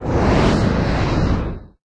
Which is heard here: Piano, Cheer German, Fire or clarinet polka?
Fire